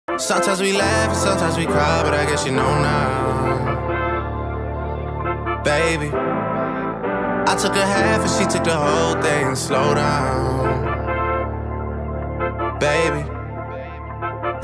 english ringtone

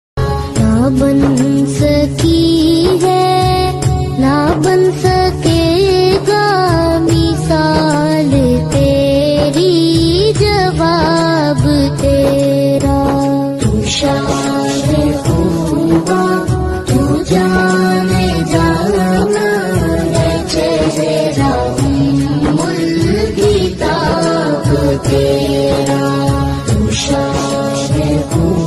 Islamic ringtones

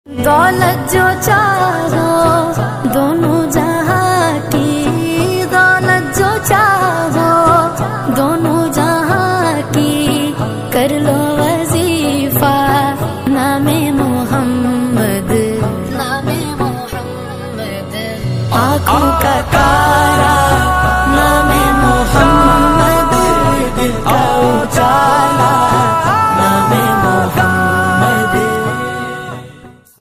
islamic ringtone

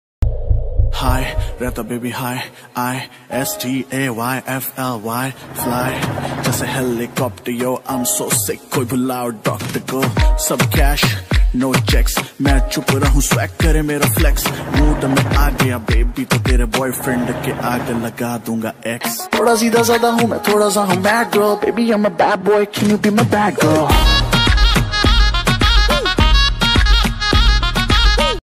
hindi rap ringtone

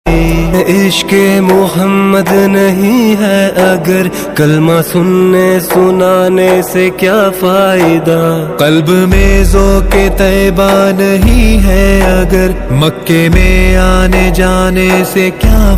islamic ringtone

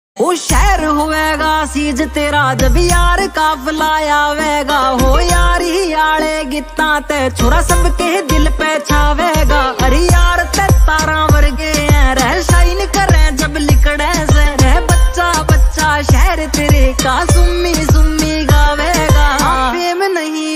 haryanvi ringtone

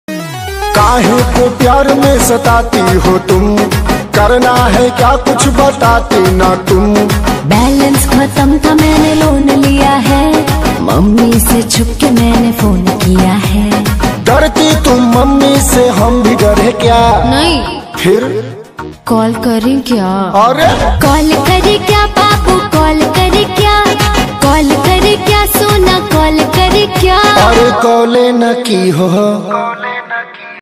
bhojpuri ringtone

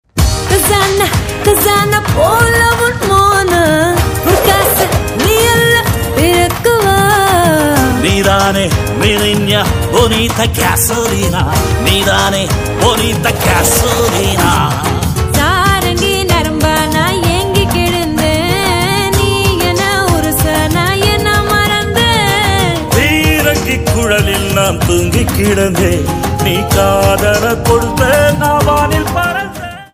Tamil ringtone

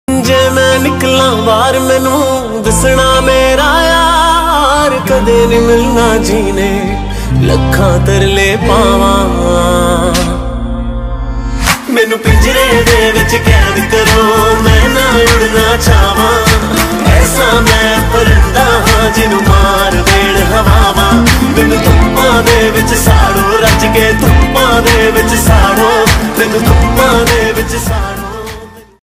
male punjabi ringtone

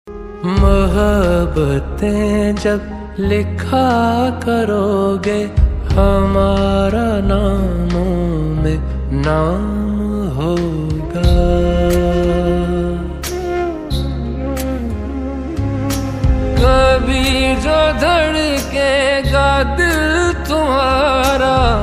pakistani ringtone; gentleman OST

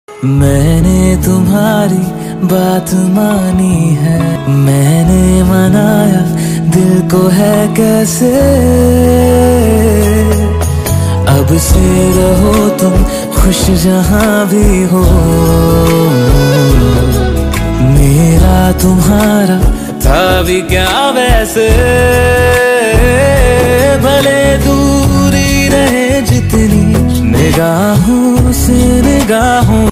male version hindi ringtone

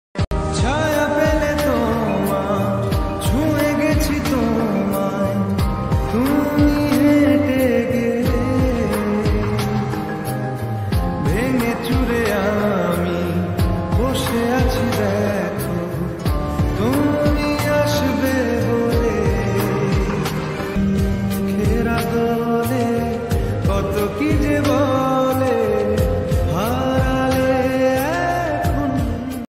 lofi bengali ringtone

